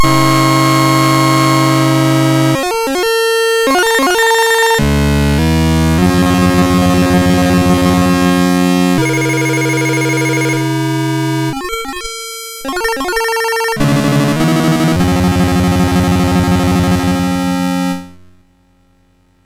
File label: Reactor Run, MOS6581R3